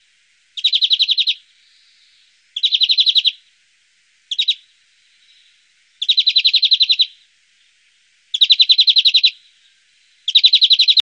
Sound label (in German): Gruenfink